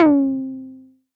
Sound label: Jupiter-6 SYNTH Trap Low - C; One Shots; Cymatics; Fantasy Synth Sample Pack